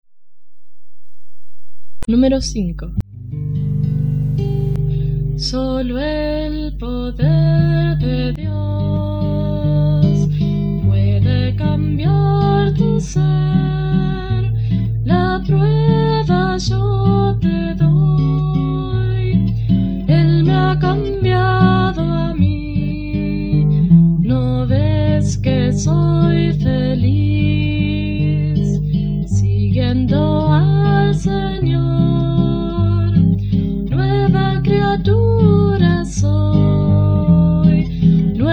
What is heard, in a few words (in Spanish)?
Coro, himnario niños